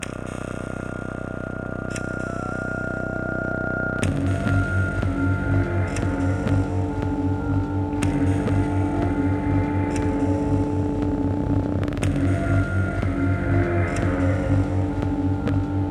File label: Dark reggae